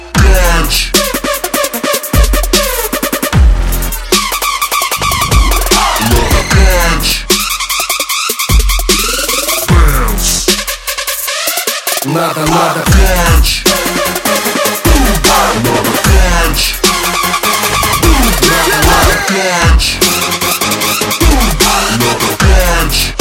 Russian DubStep

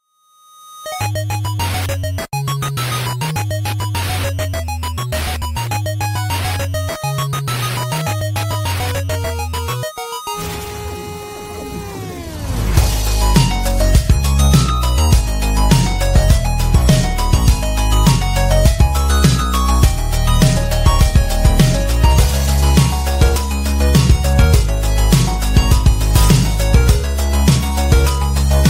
arcade intro

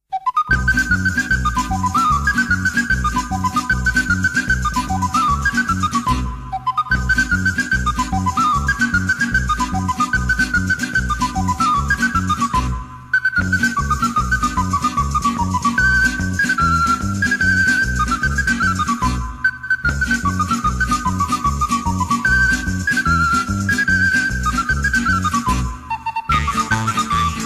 Tarantella

